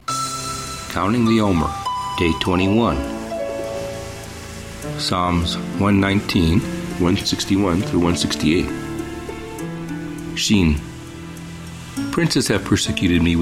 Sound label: Meditative w Waves Ocean; Omer; Psa119